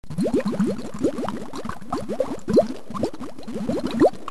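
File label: bubble, didgeridoo; Circular Breathing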